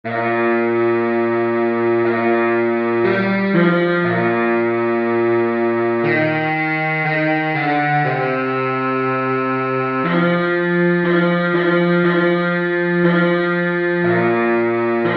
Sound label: bari sax